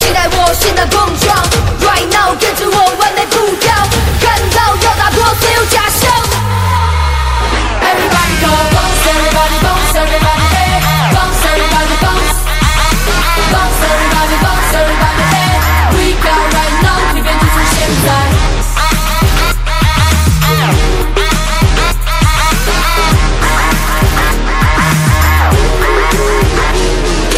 music taiwanese ringtone

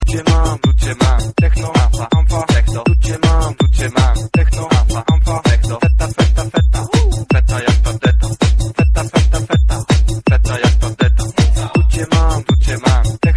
techno

